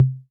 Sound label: Percussion; Toronto; Cymatics; GHOST (Beta Pack); Drum Kit